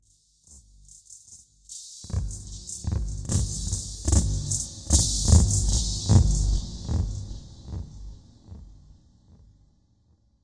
chaotic echo buildup; Atmospherics

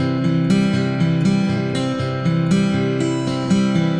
acoustic guitar picking

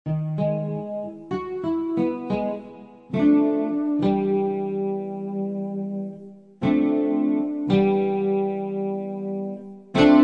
noodling around fake guitar

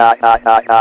hahahaha; VIDEO GAMES; wow

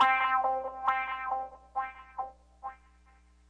echo of something, guitar

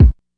kick, Classic Hip Hop

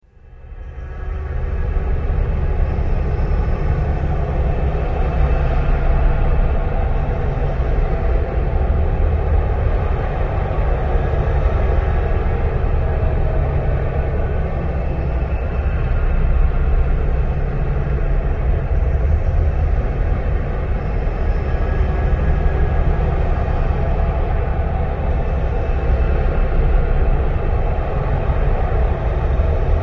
dead souls, Atmospherics

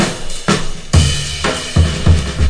wide open, DRUM LOOPS and BREAKS, FILLS and ROLLS

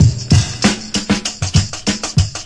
classic break, drum loops and breaks

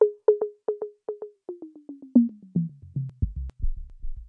echo bops; SYNTH AND ELECTRONIC LOOPS

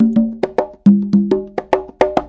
090 bongos, 090 bpm; Bazillions of Bongos

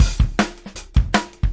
158 bpm natural straight, drum loops and breaks; 141 to 160 bpm

